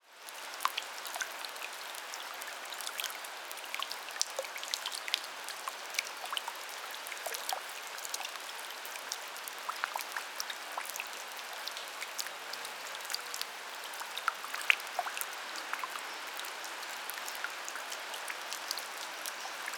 Rain Splatty Puddle Drips; Soundopolis; Natures Fury; Fienup

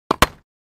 Ball Hit Net, Tennis Balls; JC Audio; Tennis Balls And Ambience

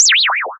fall, Retro Sounds; Kenney's Sound Pack; Retro Sounds 2

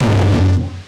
Mid Tom; Percussion; 99Sounds; Dark Engine; Burnt Hits

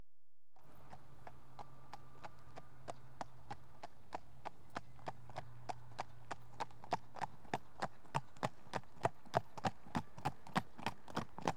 One horse at trot, on tarmac; Horses; BBC Sound Effects Library

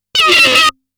Aliens Monsters; FXHome

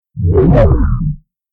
time stop; FXHome

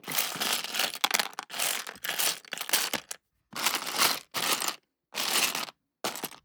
Box Lego, Rummage; SoundBits

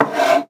glass move; Sound Design Tools; Raw Foley

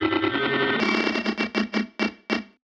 Movement; 99Sounds; InterSpace

